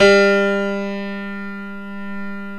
PIA SYN; Best Service Gigapack 1

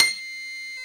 PNO, Electrics, Grands; Club-50 - Foundations

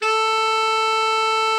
TENOR, Sax; Giga Samples Collection

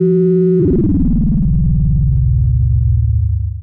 Osc vs Ring Mod, vintage oscillator; radiosonics

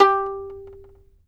fiddle pizz G4 R; Sampleheads; Whole Lotta Country 1 & 2

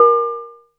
DULL, PERC HITS; Zero-G Ambient 1

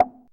BLOCK; AMG - Vince Clarke Lucky Bastard

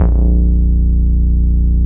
HOT-DOG BASS, Bass Analog & Synth; AKAI; USB Soundscan vol.12

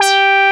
JUNO SOLO, JUNO 106; Greytsounds; JUNO 106-60

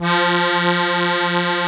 ZUPAN CHRO, AKKORDEON; Best Service; Alpine Volksmusik

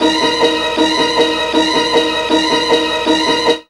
CHINA LOOP, STABS

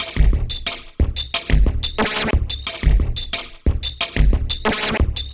090bpm, Downbeat Dance Grooves; Zero-G

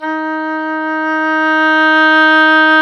oboe dry; Roland L-CDX-03 Disk 1; Oboe 4-8

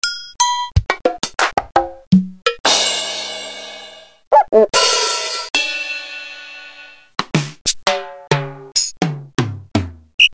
Yamaha RX-7

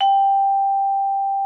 VIBF G5, VIBES; Xsample VOL-4

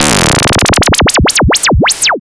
scrapper

